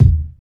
fluffy bass drum d sharp key; kick drum

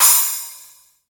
big crash sound sample g sharp key

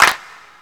short reverb hand clap sample G# key

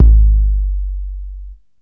puffy kickdrum sound g key

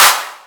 clap sound d sharp key; percussion; single hits one shots

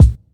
dope kickdrum C key

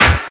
old school natural snare drum sample e key

